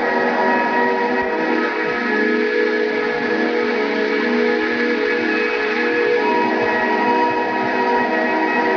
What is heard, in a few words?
boosh; svencoop; cyborgaudio